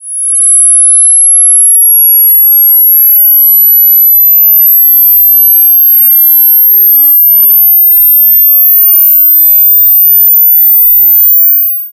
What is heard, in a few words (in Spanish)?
frecuencia